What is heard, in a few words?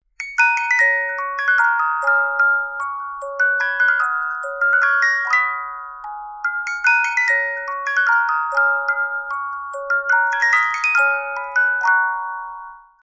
musicbox